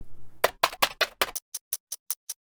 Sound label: No Reverb